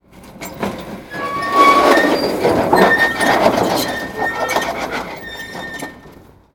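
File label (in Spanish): Persiana Metalica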